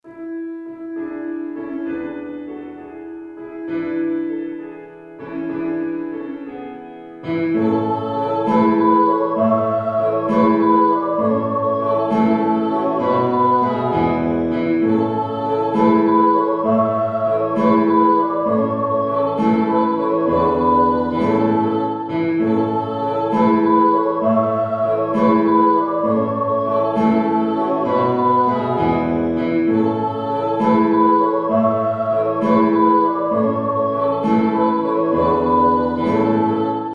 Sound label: i saw three satb